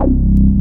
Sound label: C1, SynthLine; 90 s Sample CDs; NiceBeats; SynthLine VOL-20